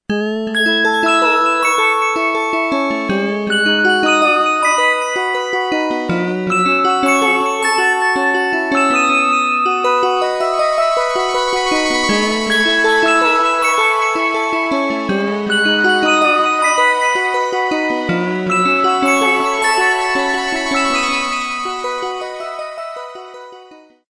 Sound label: Waterdrop; LG KM500